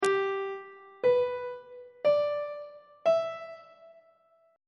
f double sharp major 6th chord intervals on treble clef